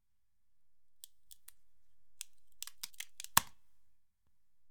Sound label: Bone Breaking Realistic Breaking Bone Crack